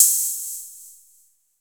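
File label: 808 hi-hat open, TR-808; East West; Global Sounds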